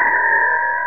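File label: transporter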